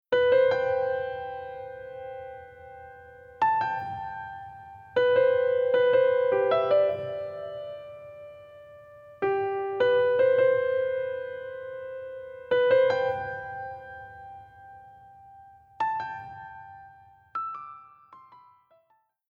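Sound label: Reverb Hall; Mix Send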